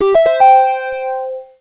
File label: Hospital Pleasant